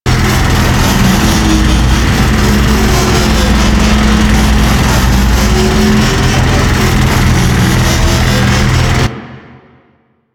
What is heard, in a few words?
Asteroid Scanner Loop; Ambience; Props; Tektite; Warframe; Corpus Railjack